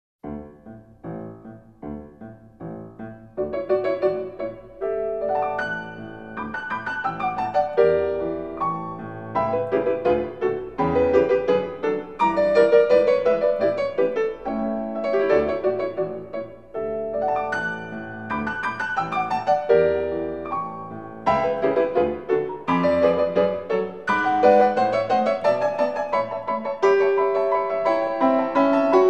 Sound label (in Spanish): Danza De Los Mirlitones Piano; Piotr Tchaikovsky; Cascanueces